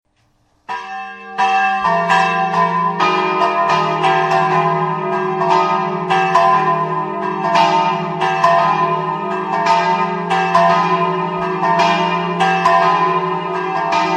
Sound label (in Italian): campane; FORAME